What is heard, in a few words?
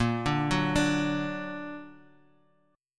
A sharp Augmented A sharp; x,x,x,3,2,1,4,x